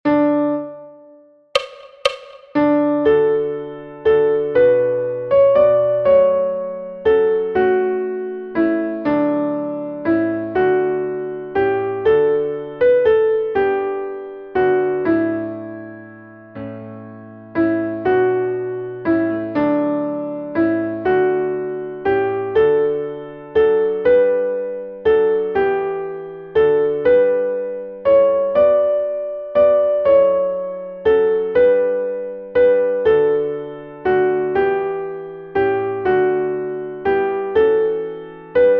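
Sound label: drovers soprano; Eklektika Music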